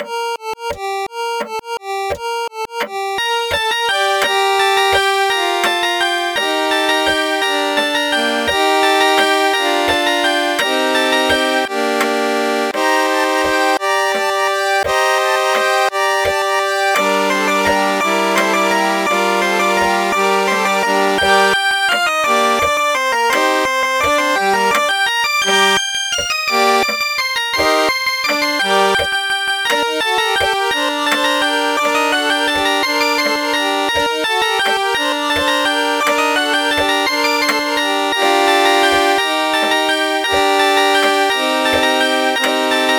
chiptune of the bells; weeklybeats 2012

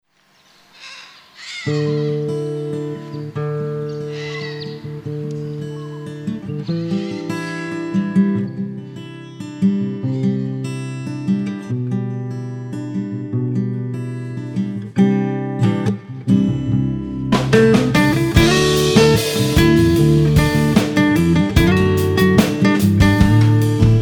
morning guitar; weeklybeats-2012

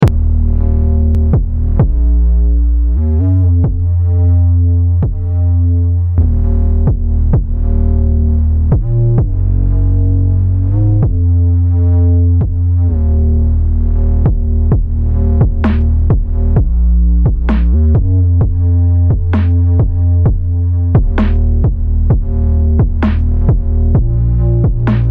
phonk; weeklybeats 2026

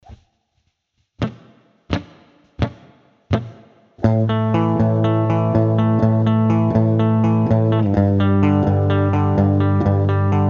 just a guitar jam

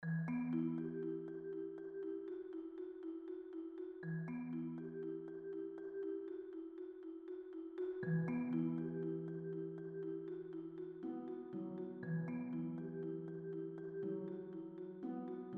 pretty lame orchestra test; weeklybeats-2020

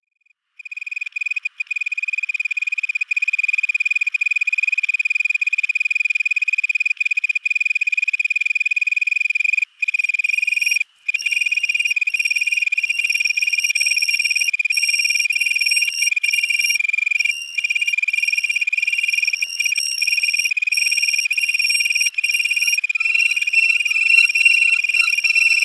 cicadas and flutes; weeklybeats-2016

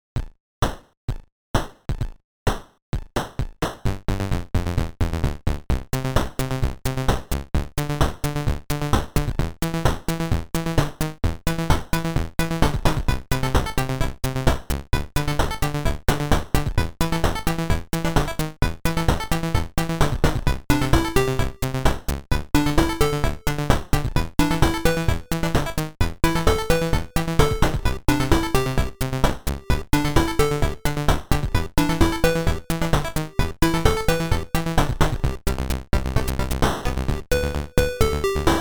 turbografx dance pce; weeklybeats 2024

